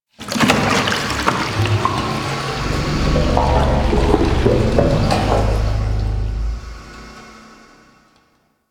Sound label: Metal Pipe One Off; Ambience; Props; Warframe; Entrati